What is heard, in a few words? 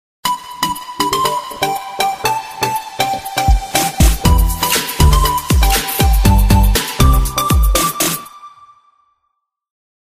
Xiaomi Poco X5 Pro